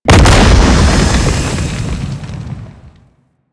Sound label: Octopede Unholy Ground Geyser; Warframe; Man In The Wall